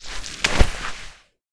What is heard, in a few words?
harvest use success; Stalker Anomaly; interface item usage